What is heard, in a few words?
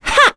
Attack; kingsraid; voices heroes en